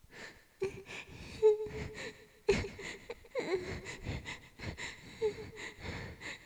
susurinaki

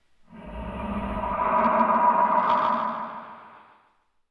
kowaioto

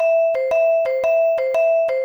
pinpon; チャイム２; 連続ピンポン ループでしつこくどうぞ

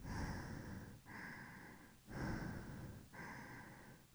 neiki; 女; 息 ６ （寝息）; くーくースヤスヤ ループ用

